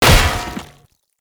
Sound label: Great Sword Impact Armour, Combat; Weapon Impacts; Player; Swords; Founders Great Sword